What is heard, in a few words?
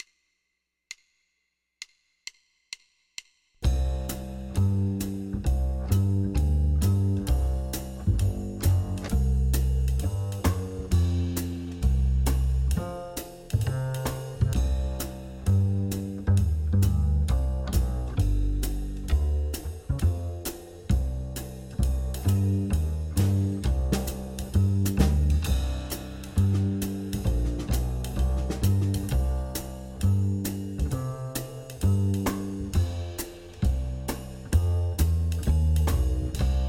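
blues piano guitar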